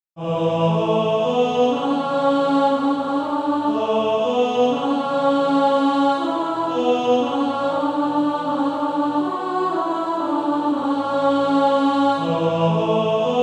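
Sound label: Male Choir, Practise Music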